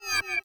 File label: move mirror; photon path